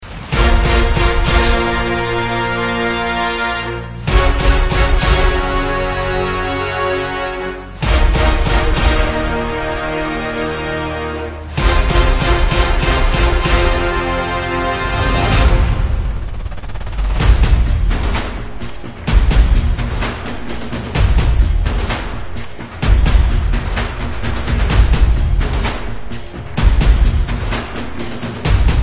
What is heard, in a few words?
Karaoke